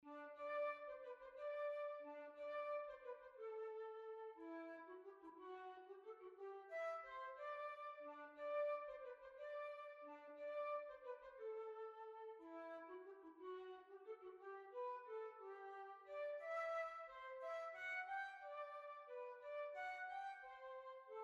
String Quartet

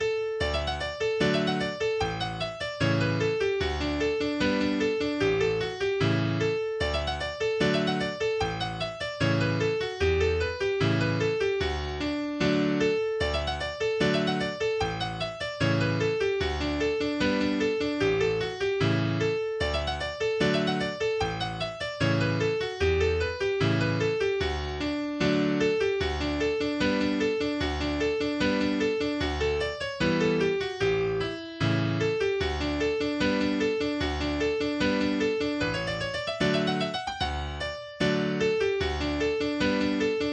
Marsden Hornpipe, english dance tunes; eighty english dance tunes

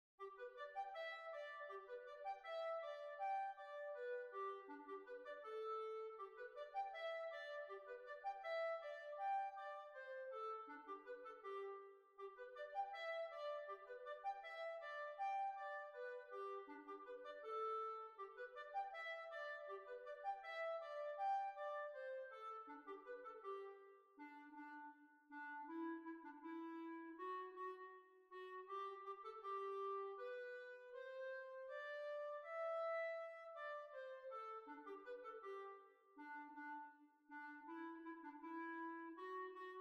Holywell Hornpipe B flat

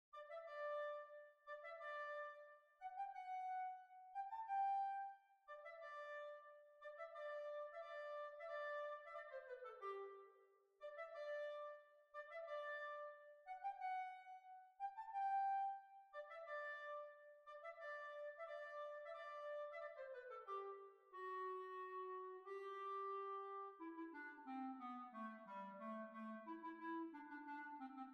Hornpipe; Portobellow Hornpipe; B flat

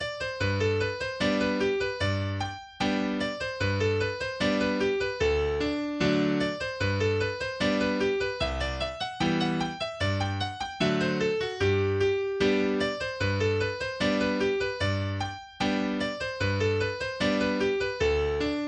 Paganini Hornpipe, english dance tunes; eighty english dance tunes

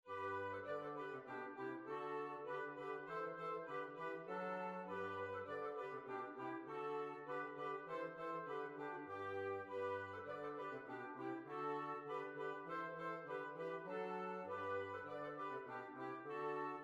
German Dance

